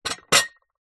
household stacked crockery down on surface

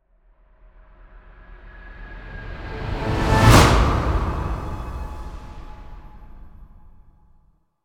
Swell To Impact Orchestral no orch impact; bottlerocket

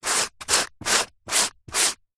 cardboard small pieces rub scrape together; zapsplat; foley

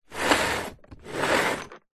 industrial power tool zip case slide on table

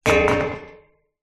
wood branch lean against metal gate pole; foley

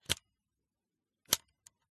smena symbol camera crank pull and collapse; dpa4060; pmsfx

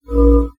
multimedia menu or alert tone

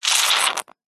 foley liquid foamy hard squirt dribble

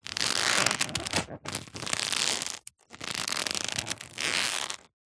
foley leather baseball glove movement creak

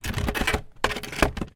household chair wooden garden drag paving

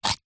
pencil sharpener plastic small pencil remove; office

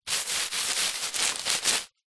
household baking grease proof paper rub flatten

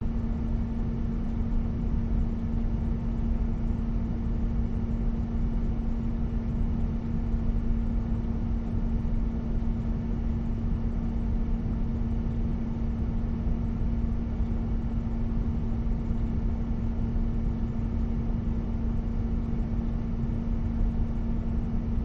boiler room ambience loop; the sound pack tree

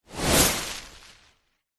foley tree leaves branch whoosh impact designed

